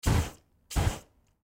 Fireball Hairspray, Fire Hairspray Lighter Blow Torch; Fire Hairspray Lighter Blow Torch Double Spray; Flames; Objects; Flame Sound Effects